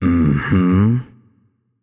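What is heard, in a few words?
agree, non-verbal; voicepack; smite; merlin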